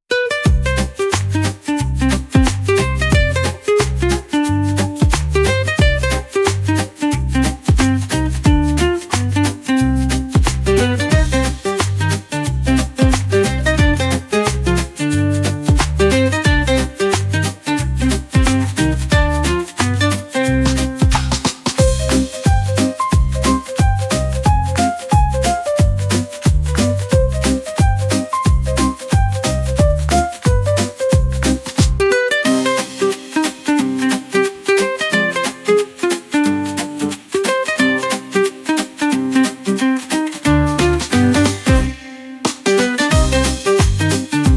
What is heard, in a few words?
90 bpm Spanish Shaking Eggs Rhythm Guitar; 54 sec; ai social media music